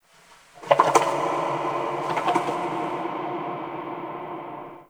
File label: Under City Small Creature